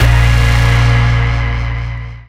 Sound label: Synth Bass; Vengeance Samples; VEE Synth Shots